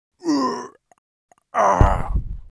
Mob die; 금전방; 백금강

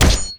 SKILL DOWN; EnvSound

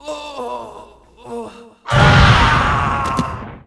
mob raid die; Monster; 레이드; 청면귀사마조

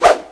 Flourish Metal Medium

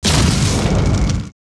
skill explo; SkillSound

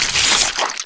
Split Flesh; Battle

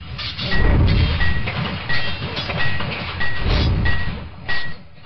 FORGE; EnvSound

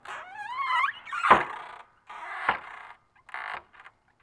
door open

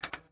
quick use

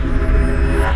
skill shot charge; SkillSound

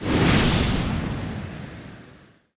turn point; Monster; 변신

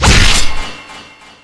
SWORD; Battle; smashing; ONSLAUGHT

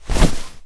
Item Out

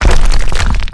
boss gate dam

